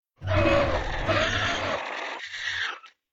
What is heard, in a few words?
nosach Death; metrocraft; customnpcs